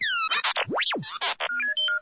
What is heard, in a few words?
r2d2 processing